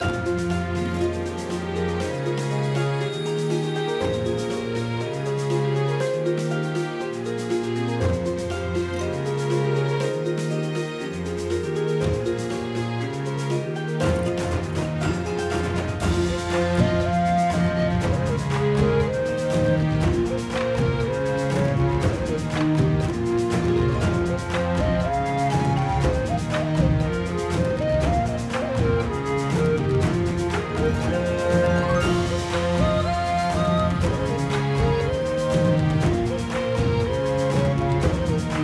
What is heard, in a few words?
Mini Game General 120 Bpm; legrand legacy tale of the fatebounds